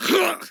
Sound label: cough